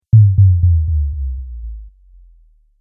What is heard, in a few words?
Sub Drop Falling Delay, low impact; Low Impact Sound Effects